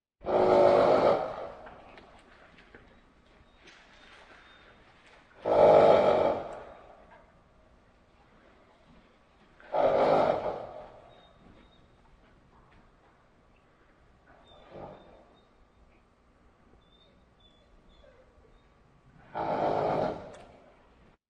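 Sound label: horse snorts 5 times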